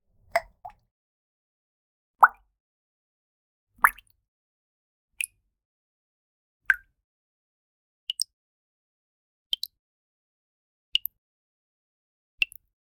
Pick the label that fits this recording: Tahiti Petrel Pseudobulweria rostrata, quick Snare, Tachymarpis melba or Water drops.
Water drops